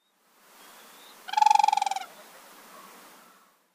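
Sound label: Siberian Crane, izumi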